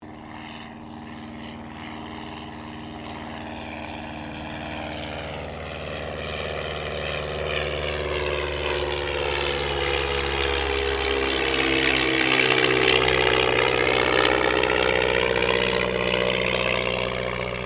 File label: AIRCRAFT DH82A DEHAVILAND TIGERMOTH PASS